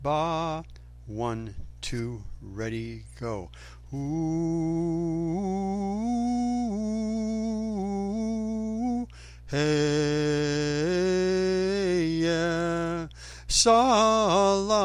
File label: Ishmael bass; Ishmael all the way